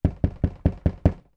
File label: knocking on plastic storage box; foley